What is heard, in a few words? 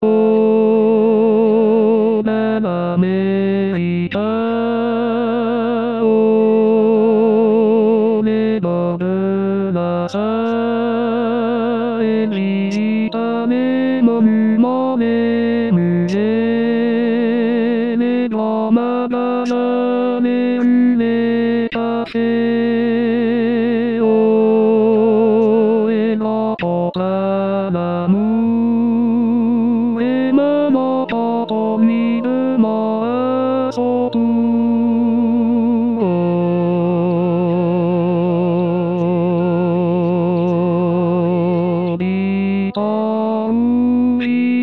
tenor